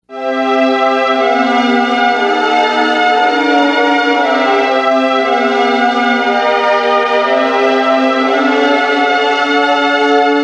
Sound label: Progression, chord progressions